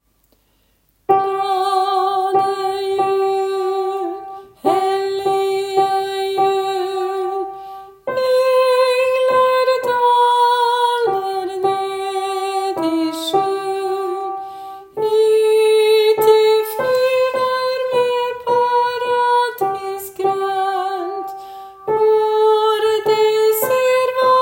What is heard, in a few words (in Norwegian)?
glade jul vers tenor; Glade jul vers 1+2 tenor; åfjord